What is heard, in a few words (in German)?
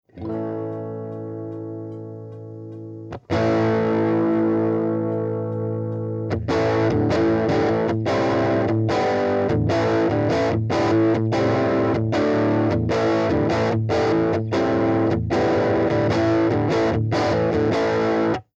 Crate Combo SM57 Low Gain mit Strat; Millennia HV35; PolFlip